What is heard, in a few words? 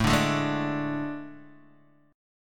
A Major 7th Suspended 4th, Guitar, DADGAD